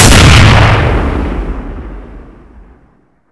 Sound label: awp; weapons; cstrike